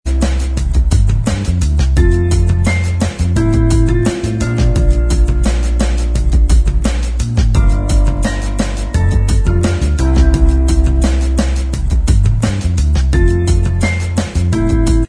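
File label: instrumental, backing tracks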